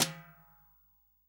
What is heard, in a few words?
SWING SNARE CHROMATIC, Real Drum Kit; REAL DRUMS